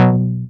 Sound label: 5th soft; instrumente